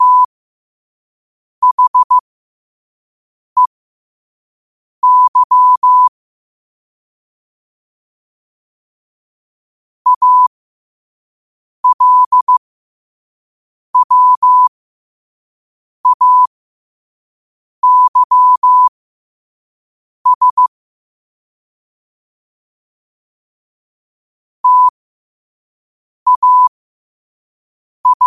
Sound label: 5 wpm, morse